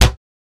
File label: Cabinet Door Slam, Close, Interior